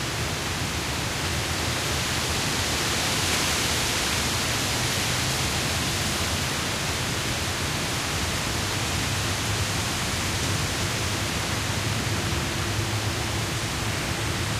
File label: jungle wind no life; Source Engine; tf; lair